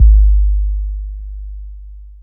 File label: SYNT BD; E-Lab; X Static Goldmine 2; akai